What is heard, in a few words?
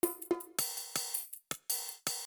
stem verse drums; boss music